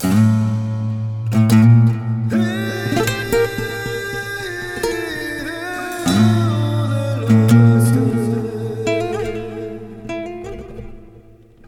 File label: guitar, Loops